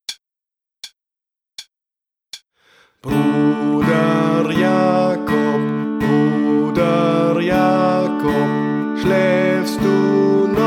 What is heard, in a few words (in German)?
Bruder Jakob, gitarre; Garantiert Gitarre Lernen